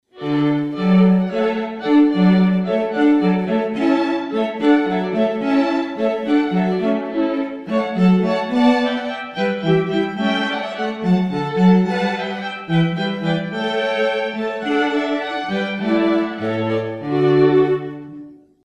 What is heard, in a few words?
Hornpipe from Water Music, string quartet